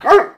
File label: "ARF!"